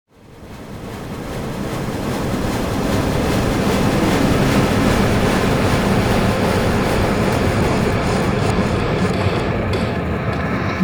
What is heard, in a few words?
RVRS, Cinematic Metal Impacts; boom library